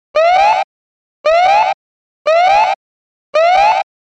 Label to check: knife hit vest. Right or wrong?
Wrong